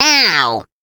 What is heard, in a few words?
Classic Out Sound; Jolly Battle